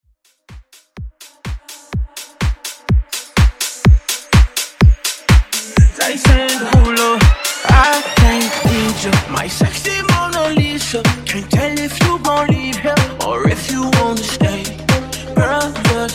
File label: top40 bass house mashup